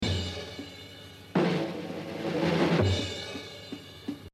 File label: Exercise, jazz drumming; Art Blakey; The Evolution of Jazz Drumming (Gottlieb)